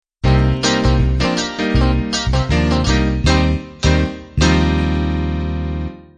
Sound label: 60s pop end